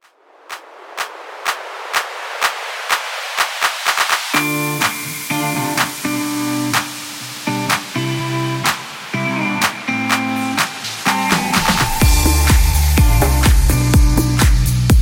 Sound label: Segue 125Bpm Clean